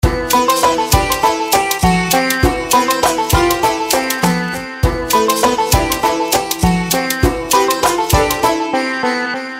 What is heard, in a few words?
Indian Sound, ringtones; NGM